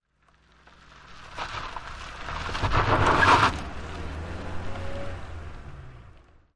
Car Tires Gritty; Peel Outs, On Board Perspective 5x